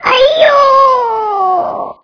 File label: foot left; TomCat